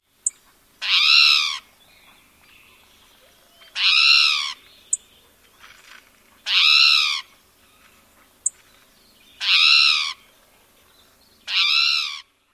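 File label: SPOTOW mew calls and chips, spotted towhee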